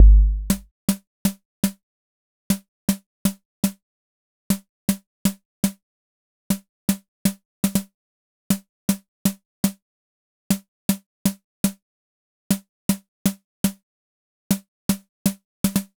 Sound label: Snare, Deep Acid; Sonic Foundry (Sony Creative Software); Afterhours EDM